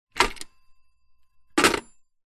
household telephone vintage rotary style pick up then hang up receiver